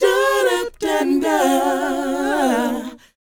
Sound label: DOWOP G#4, Vocal Planet, R&B & Dance, DOO WOP; ILIO